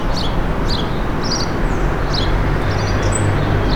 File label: Passer domesticus song